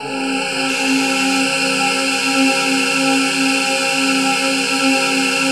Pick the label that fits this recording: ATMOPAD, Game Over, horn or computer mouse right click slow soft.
ATMOPAD